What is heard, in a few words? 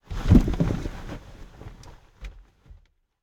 foley hit; driver; Blind Drive